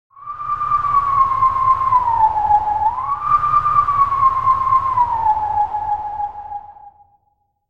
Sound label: Wolf Song (Howling Stone)